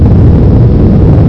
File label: rock fly, weapons; quake2; mnhcoop